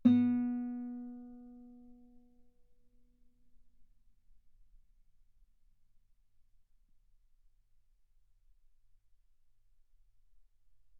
Guitar ff sul B B3

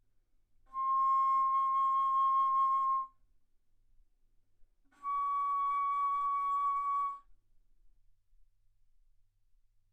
Bass Flute C6 Db6

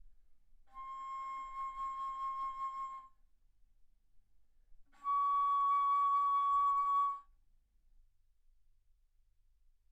Bass Flute C6 Db6; ff

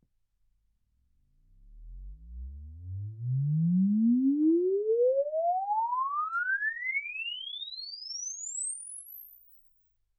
exp swept sinus 10Hz 24kHz

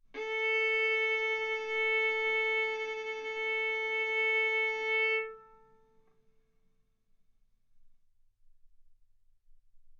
Violin arco A4 B4; mf; stereo

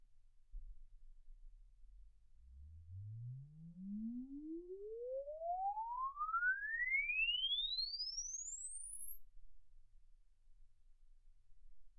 exp swept sinus 10Hz 24kHz

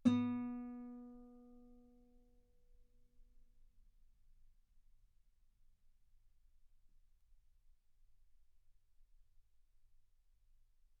Guitar B3; ff

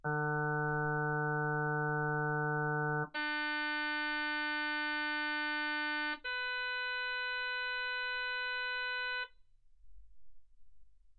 harm sinus 48kHz

